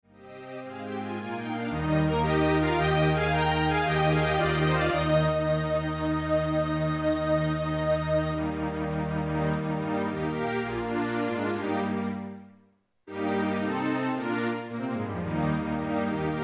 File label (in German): hornkonzert orchester; mozart